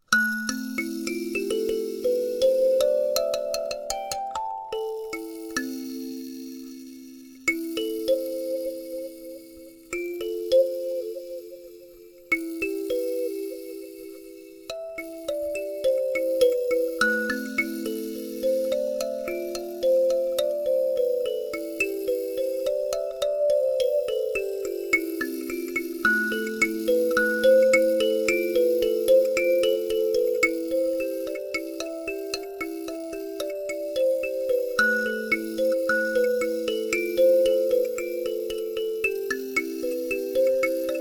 Karimba Box A minor

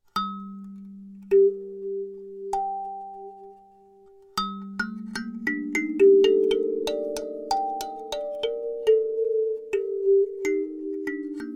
Box Penta G, kalimba; AkeBono

